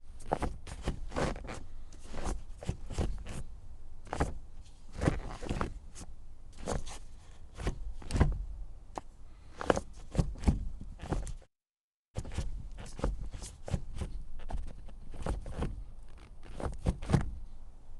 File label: nissan r33 skyline gear stick movement; Sonniss GDC